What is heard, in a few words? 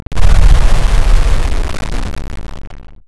loud roar; opensurge